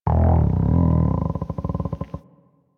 Venus Landscape Mushroom Creak Alt; Ambience; Warframe; Venus Open World

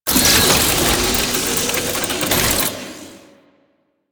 Shrine Assemble Activate; Warframe; Duviri

